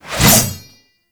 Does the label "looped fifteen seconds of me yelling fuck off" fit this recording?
No